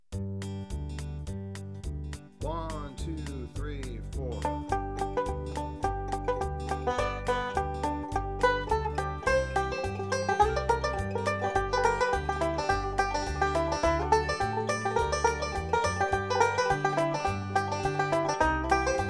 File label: bugle call stereo